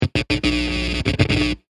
Electric Short Stutter, Broken, stuttering electricity buzz; LYN111 package of Electricity sounds